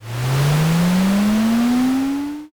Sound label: airplane